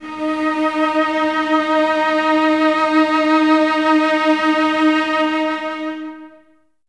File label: violas D#4, String Ensembles; Miroslav Vitous; VLS 1+2